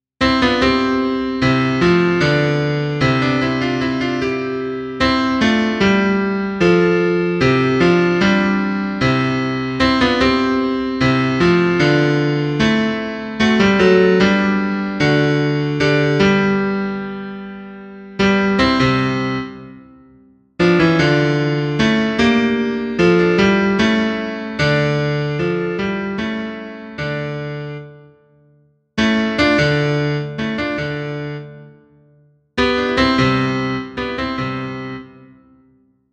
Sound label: bass